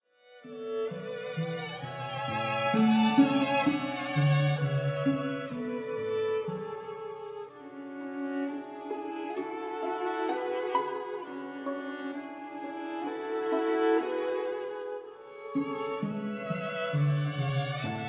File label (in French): Danse profane en mi mineur; Danses sacrées et profane